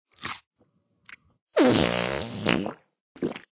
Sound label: Farting food swallow fart